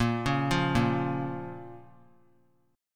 Bb Minor; arpeggiated; JGuitar

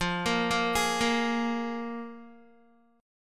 F Minor Double Flat 5th F Guitar; Listen to Fmbb5 arpeggiated

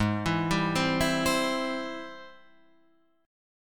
Ab Augmented Major 7th Ab Guitar Drop D

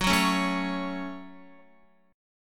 Gb Minor Guitar 4F,4A,5C,5G,5C,6E; strummed; JGuitar

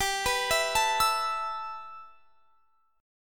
G 6th Add 9th; G6add9 arpeggiated; x,22,21,21,22,24

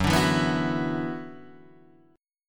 F sharp 7th Suspended 4th Sharp 5th

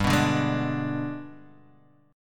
G sharp Minor Major 7th Flat 5th G

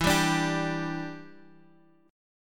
E Minor 7th, down

